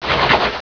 jump; quake2; hd

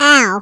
fall; quake2; karrot

